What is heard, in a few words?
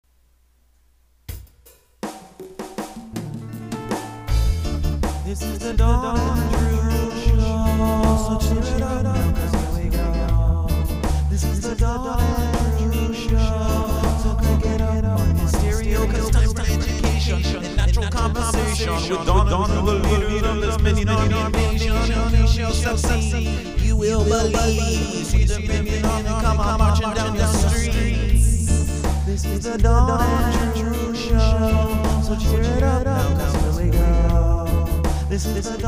reggae intro